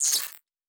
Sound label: Spark, Electric